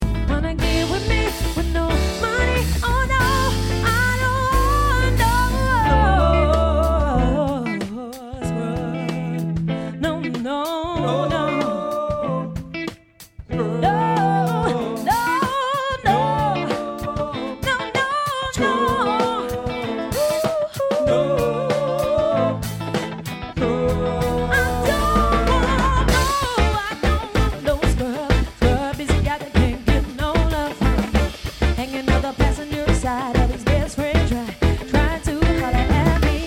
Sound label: swing beats